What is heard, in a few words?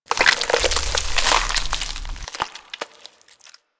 Infested Jetpack Excretion; Warframe